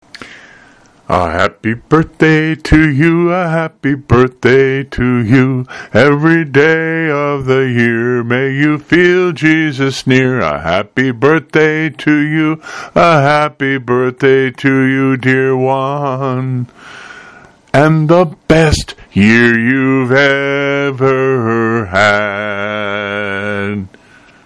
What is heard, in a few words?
Birthday Song